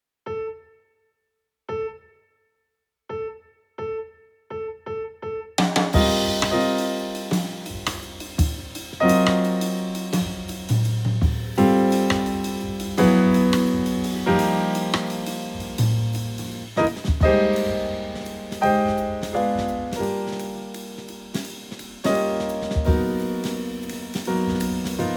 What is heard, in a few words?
Bass